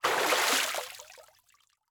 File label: Liquid Water Water Splash Small